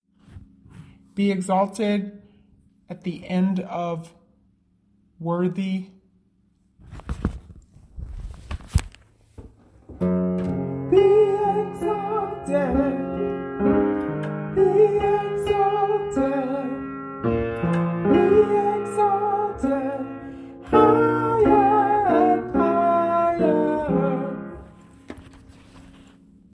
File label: bridge soprano Db